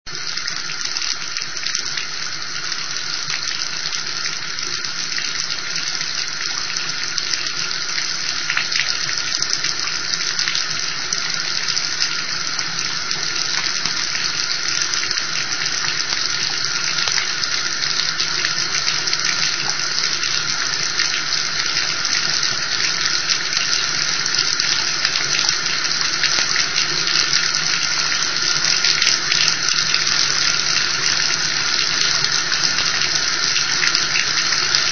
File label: tap water